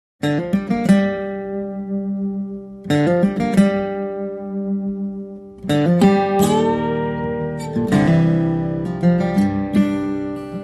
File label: slow roll